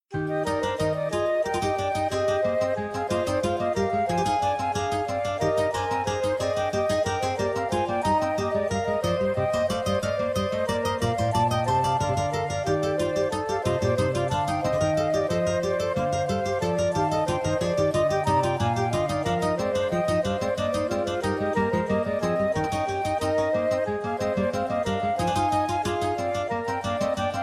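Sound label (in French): inventions pour guitares flûtes; bach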